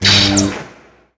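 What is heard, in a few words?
Light Saber body, Contacts avec d'autres sabres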